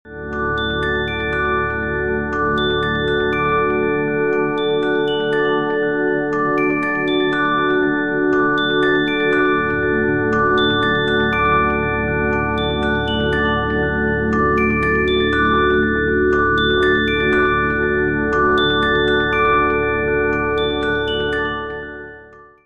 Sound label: blips ringtone